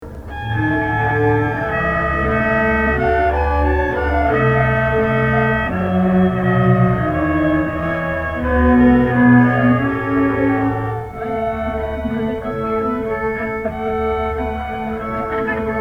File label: Street Orchestra